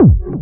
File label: hard bass drumz